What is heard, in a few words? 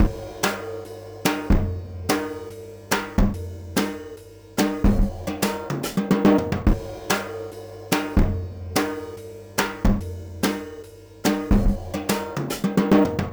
drum loop